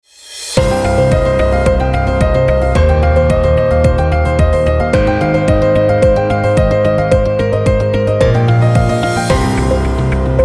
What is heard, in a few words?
breaking news intro logo; paracatunotícias